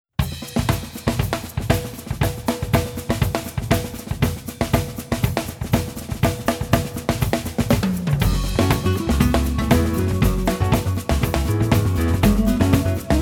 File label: Samba Double; DPA